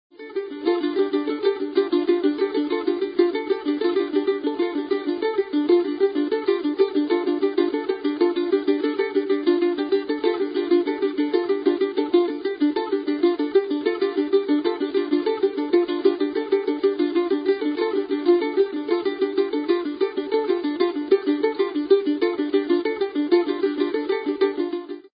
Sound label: 3 mandolins